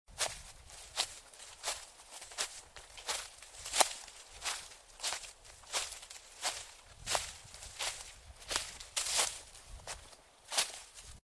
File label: Footsteps Grass Leaves; the 39 steps